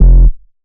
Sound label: Bass F, Bass Synth